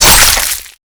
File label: strike hit